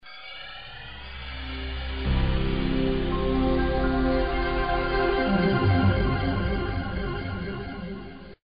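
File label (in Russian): windows nt приветствие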